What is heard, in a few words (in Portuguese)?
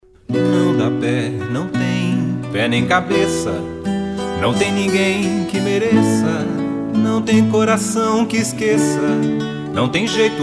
Viola urb Encontro